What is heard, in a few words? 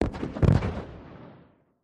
2x16 Oz Black Powder